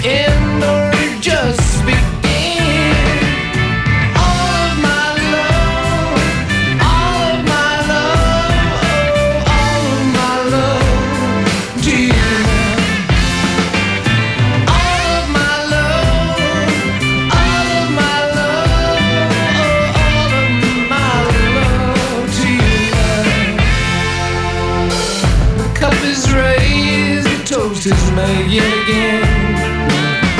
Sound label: rock